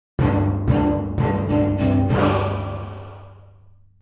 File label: Video game success victory win nordic